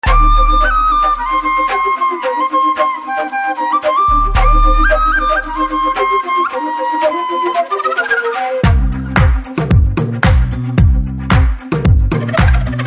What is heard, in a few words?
Flute BGM Ringtone; MCA